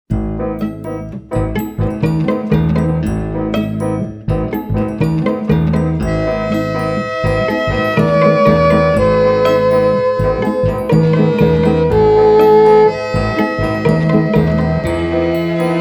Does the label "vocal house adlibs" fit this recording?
No